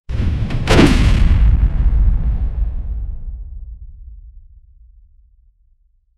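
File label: a massive blastdoor opening; architecture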